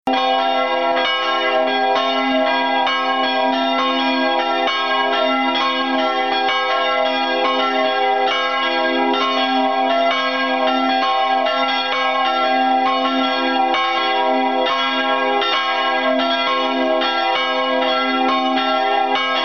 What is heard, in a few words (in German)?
Glocken